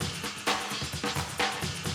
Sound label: 130, Classic DrumLoops